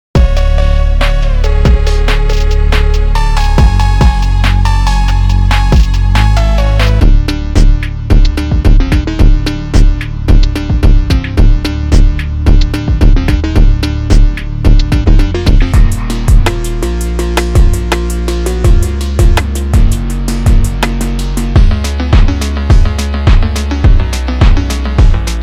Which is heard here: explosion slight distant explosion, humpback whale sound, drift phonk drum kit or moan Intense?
drift phonk drum kit